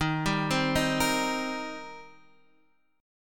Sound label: Eb 6th Eb down Guitar DADGAD